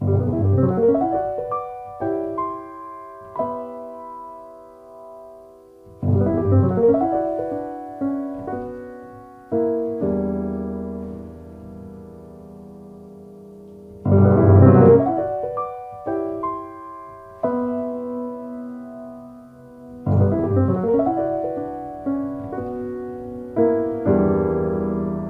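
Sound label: piano arpeggio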